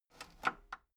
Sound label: metal lever button radio disable slow